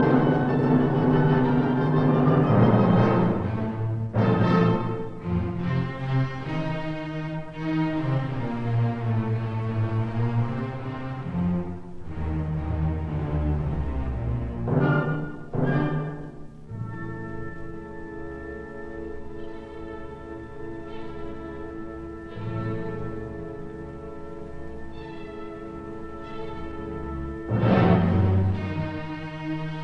Symphony in D minor Choral Finale Presto Allegro assai; Symphony No 9; Op 125; Bruno Walter